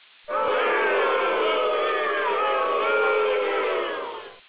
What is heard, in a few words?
boos